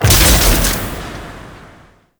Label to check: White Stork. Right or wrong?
Wrong